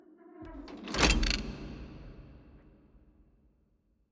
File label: torture cage close; ambience room